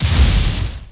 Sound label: Ice Attack; Knight Online